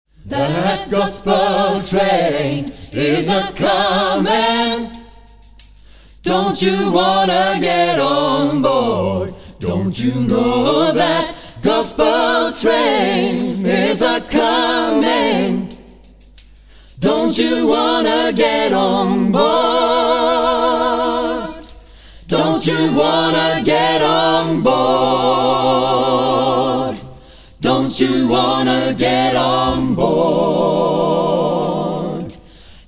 Gospel train S+B

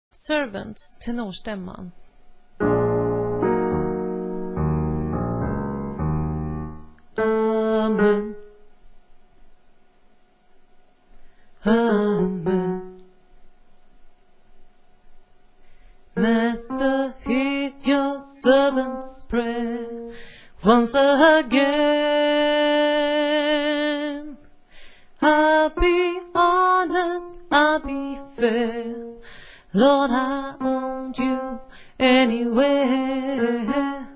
tenor

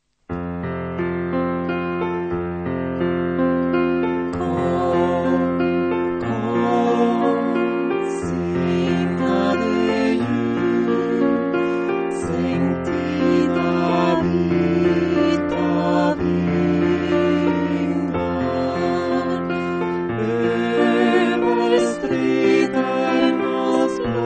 bas

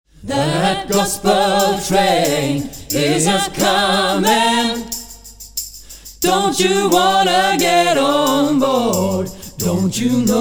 Gospel train A+T

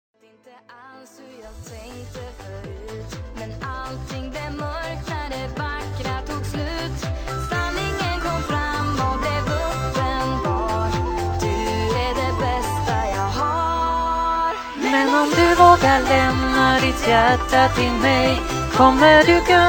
tenor; Sarek

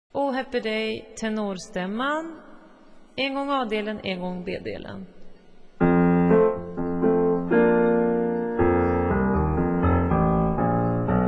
o happy day tenor

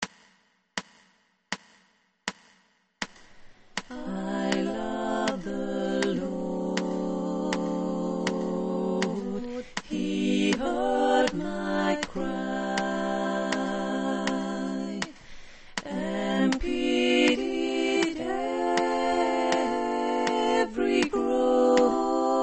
I love the Lord tenor

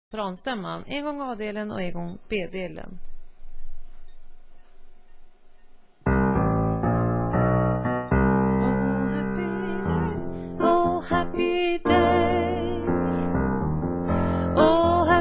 o happy day sopran

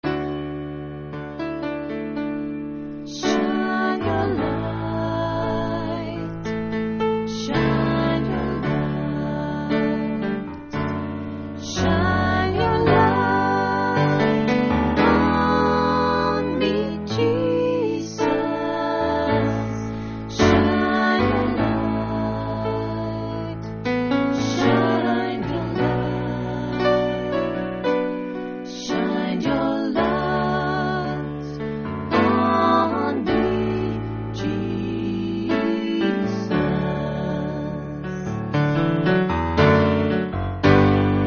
sopran

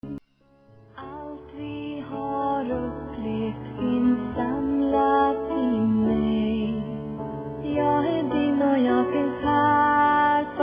tenor; Sarek

